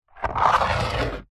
foley plastic tub lid scrape short concrete; zapsplat

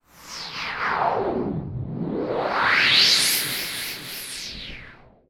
sound design transition filter pass sweep delayed

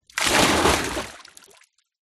nature water splash

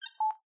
science fiction computer burst noise beep

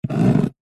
office handwriting pen draw line on cardboard box

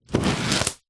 office sticky tape peel from wood

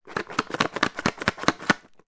stock cubes shake in box; oxo

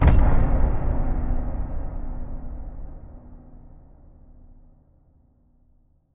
Impact Boom Metallic; bottlerocket

